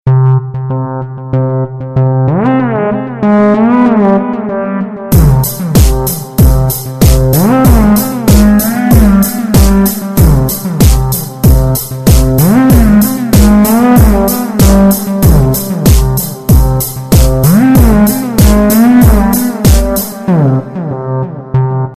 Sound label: Motorola Ringtones